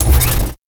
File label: Battery Start; Ambience; Props; Warframe; Orokin Derelict